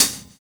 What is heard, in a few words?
Hi Hat, drums; Sonic Foundry (Sony Creative Software); Drums & Drones; Construction Kits; Stimula Kit